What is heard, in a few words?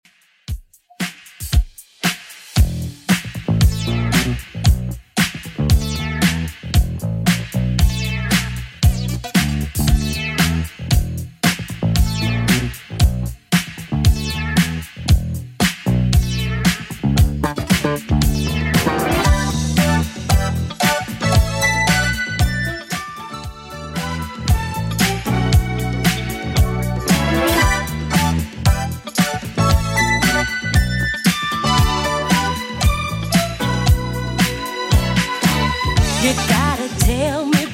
80s Redrum 115bpm